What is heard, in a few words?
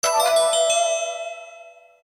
MMC removal, Nokia audio themes